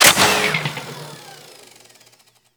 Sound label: Fire Mech, Weapons; Warframe; Gorgon